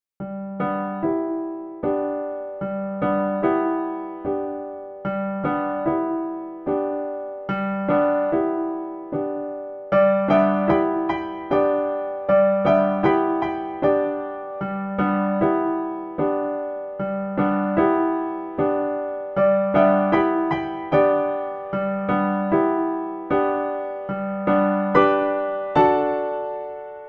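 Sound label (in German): Blütenwalzer, piano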